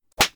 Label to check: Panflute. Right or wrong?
Wrong